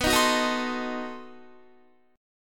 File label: B 7th Flat 9th B Guitar; strummed; JGuitar